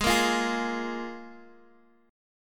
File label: F Minor Double Flat 5th Ab